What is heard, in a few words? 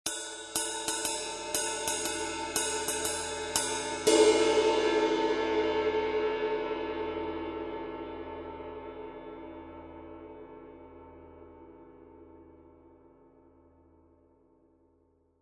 20in Heavy Ride, cymbal